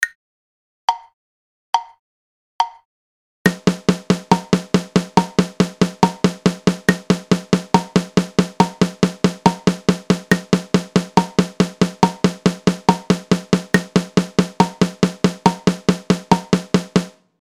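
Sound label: sixteenth note, drums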